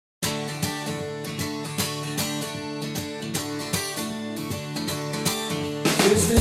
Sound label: cut